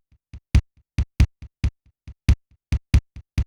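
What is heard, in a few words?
DELAYED bass drum, Techno 138 BPM; Best Service ProSamples vol.54; AKAI; CHICAGO 5 O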